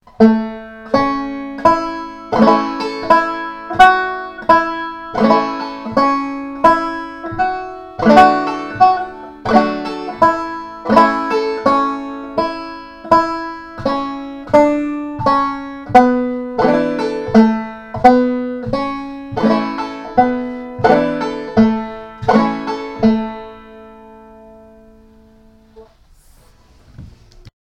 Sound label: michael row, banjo